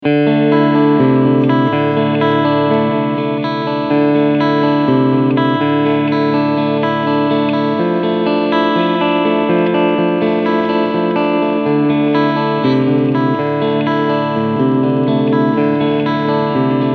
Clean beide PUs

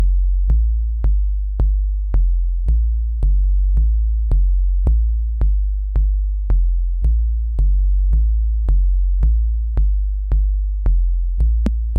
MFB-301 Pro Beat mit Bass BD

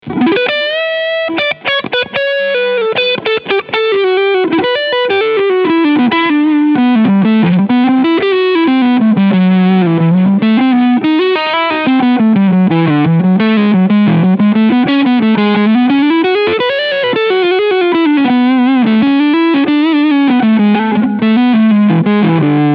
bright full ratio full Tele front singlecoil; one control golden acor

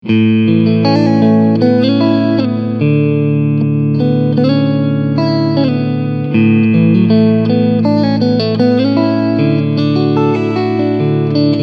Singlecoil unverzerrter Klang

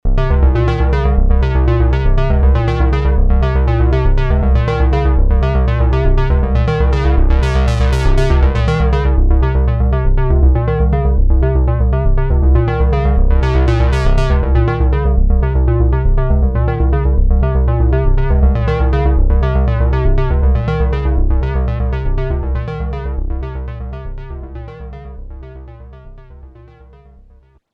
Roland JX03 Rechteck Sequenz

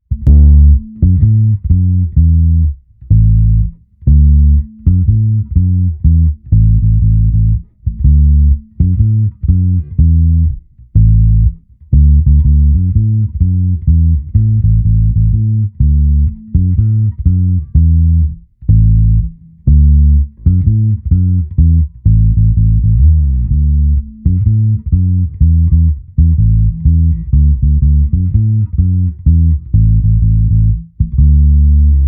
Rootsreggae

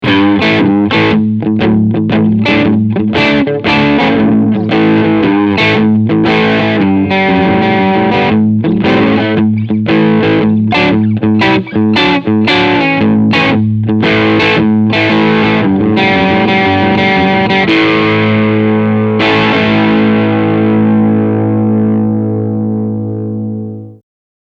Crunchsound

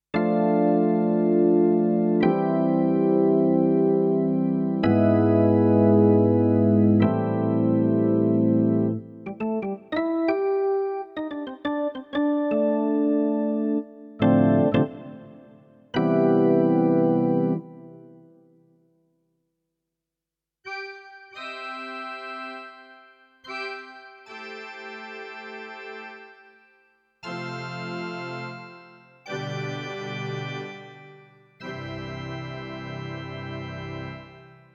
Orgel

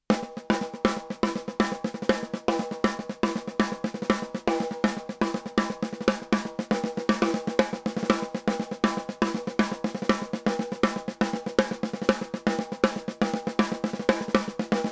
Snare All Buttons In ca. 30 Prozent Kompression; Black Lion Audio Bluey

